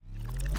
Holo-Mural Power-Off; Sx Holo-Mural Power-Off Rev

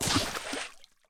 Rod Caught; unsighted

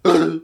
Hit

